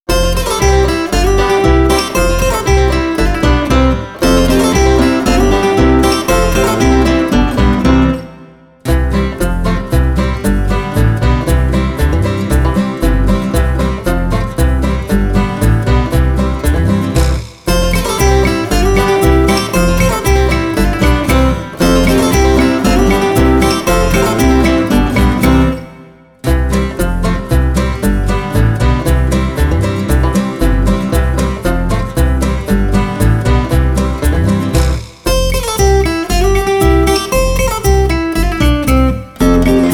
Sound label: Instrumental